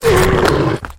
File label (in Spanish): caida